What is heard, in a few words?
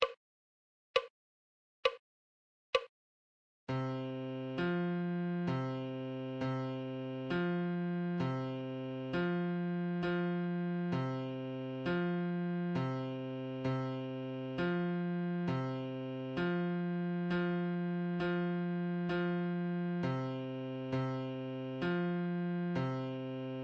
musical reading in bass clef Adagio